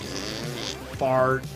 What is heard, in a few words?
fart noise